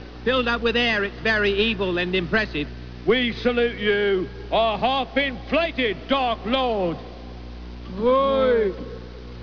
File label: TAP